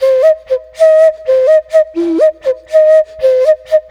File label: Bamboo Whistle